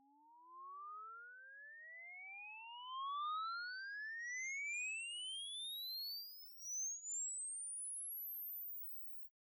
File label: Test conv, test sweep